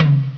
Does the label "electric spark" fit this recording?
No